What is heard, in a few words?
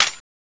clip out; tfa cso; x-45